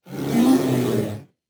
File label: panda weak; battle